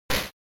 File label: General Noise